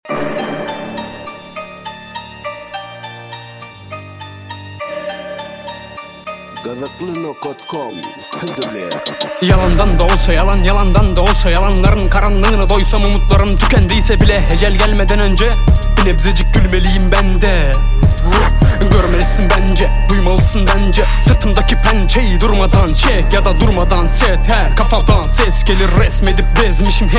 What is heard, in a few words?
rap, turkish